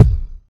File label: steel kick drum b key